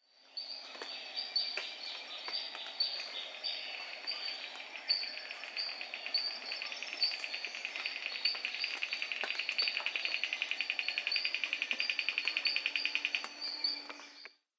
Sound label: Ischnocnema guentheri